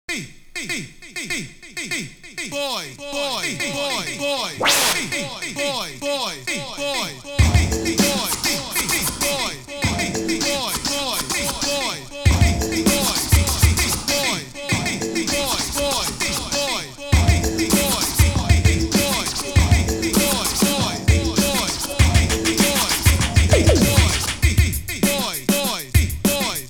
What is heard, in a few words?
(Dub Instrumental); Easy Street Records